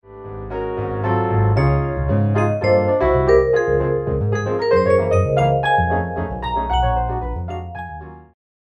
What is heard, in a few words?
Jazz Trio